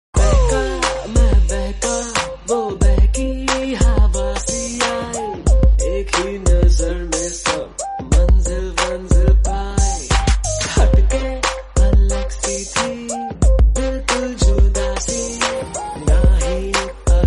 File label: Song Ringtone; Ghajini Movie